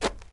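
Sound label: shuffle, footsteps hard surface jump up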